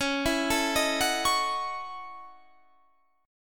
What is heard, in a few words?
Gb Minor 13th Db